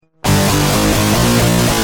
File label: Guitar Rage, Industrial